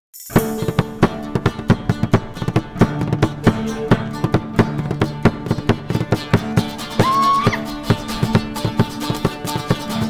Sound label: tavern Arranged; The Witcher 3 Wild Hunt; Novigrad